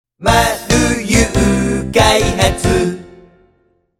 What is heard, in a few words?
Soundlogo; 丸友開発様